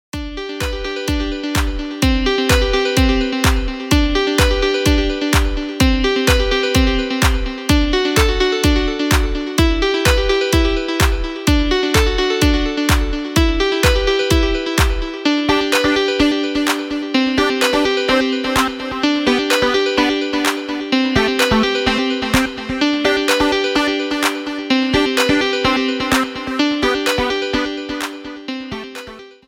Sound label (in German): musik hoerprobe